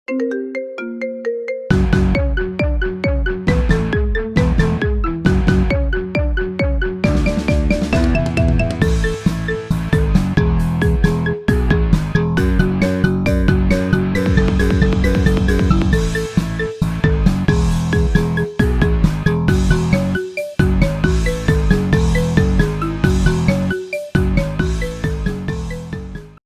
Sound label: Marimba Remix